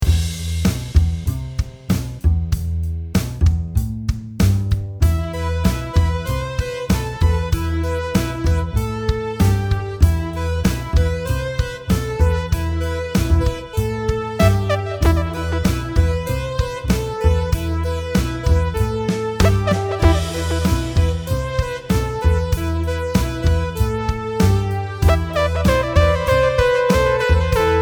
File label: song